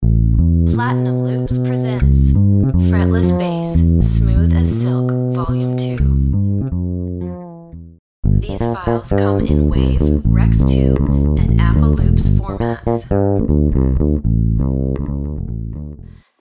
fretless bass, Fretless Bass Samples for your Beats; Capture the fretless bass sound of the 80’s